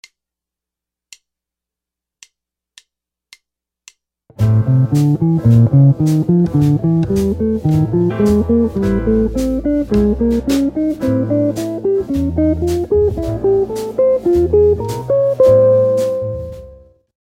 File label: pentatonic scale pattern, guitar